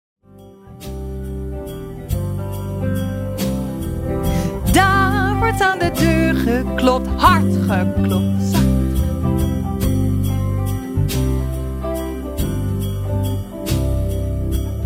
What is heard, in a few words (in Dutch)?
Daar wordt aan de deur geklopt Instrumentaal